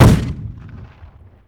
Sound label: Grenade Launcher-Fire; 1944 Battle Of The Bulge